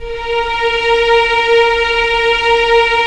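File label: Strings Violins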